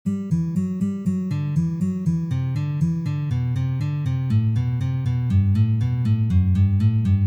Uprazhnenie dlya igry gammy Sol mazhor

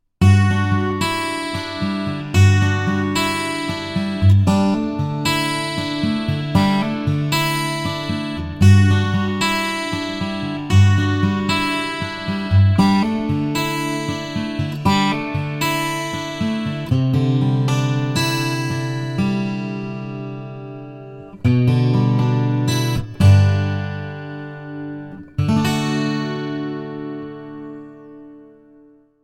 Transakusticheskaya gitara Chorus Reverb

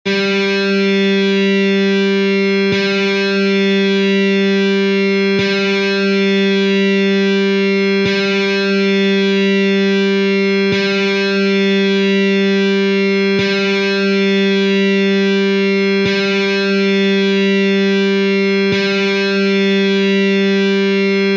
struna Zvuki strun elektrogitary

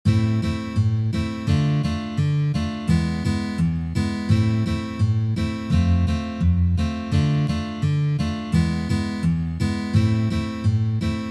boya

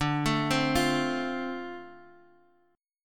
Perebor Dm7

